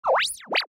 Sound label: Enemy Slime Rebirth